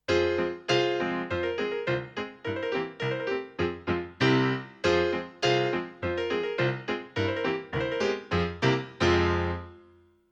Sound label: melody, melody in f minor